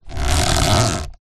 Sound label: transport car hand grasp steering wheel leather squeak